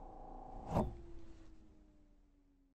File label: chargestop; interact-sound